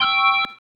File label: Stab, Organ, One Shots